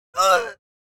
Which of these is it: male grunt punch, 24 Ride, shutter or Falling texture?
male grunt punch